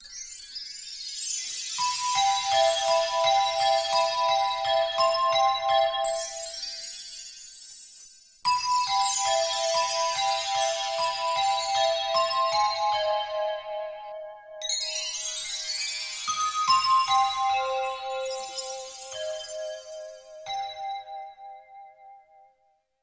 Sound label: Chorus & Vibrato, Wind Chime & Handbell, Yamaha TX16W